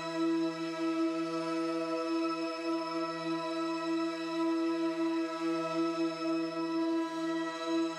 Drone; stereo toolkit samples; Non Tempo Loops

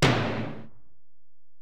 Tom, Alesis DM-PRO, Drum Machines, Toms

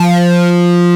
OBERHEIMS

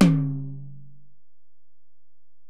Tom, Alesis DM-PRO

